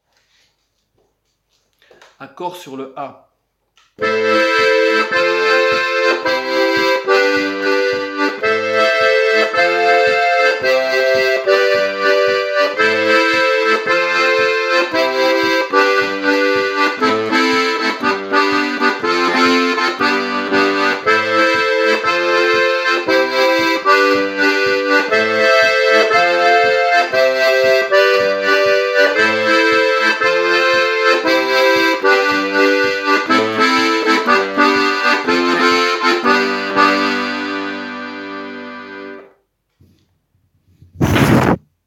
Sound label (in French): accords